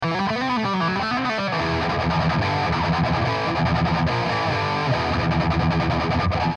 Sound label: power chord